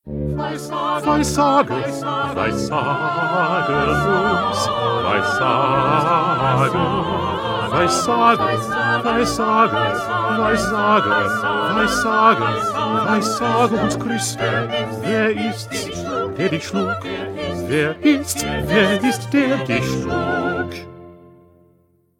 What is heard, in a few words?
2nd Bass; Bach; St Matthew Passion